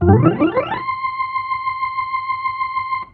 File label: hammond slide up, organ; FreelogHS10